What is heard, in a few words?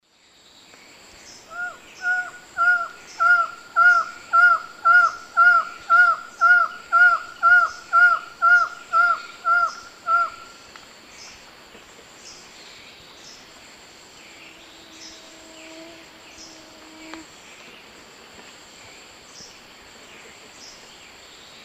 Micrastur buckleyi, calls juv; S. America; Eastern Lowlands